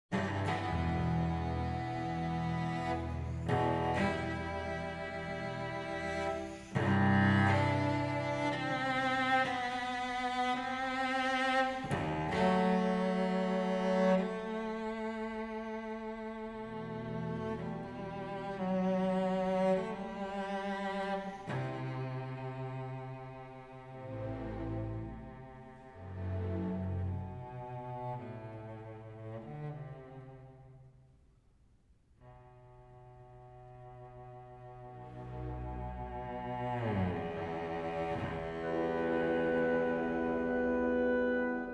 Concerto for Cello and Orchestra in E minor, Adagio